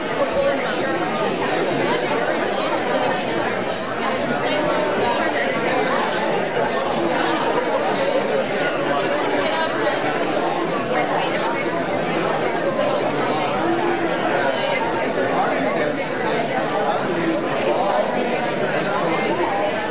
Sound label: Talking Noise Loop